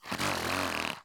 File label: donkey random; Minetest; VoxeLibre; mobs mc